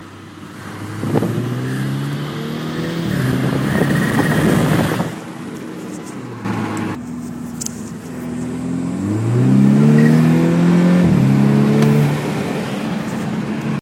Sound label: sound, Golf R; vwwatercooled; viezu tuning golf r